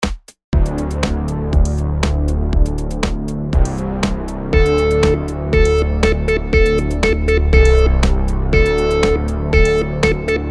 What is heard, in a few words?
rhythm simple, monotone